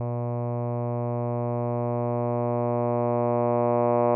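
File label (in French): A, un "a" d'une voix de basse, variation du coefficient de disymétrie alpha de 0.6 à 0.9, sans vibrato; AteliersHenrich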